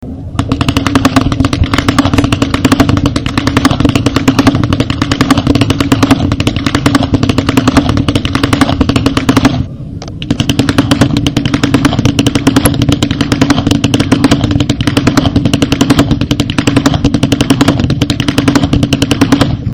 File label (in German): schnalzen; feldkirchen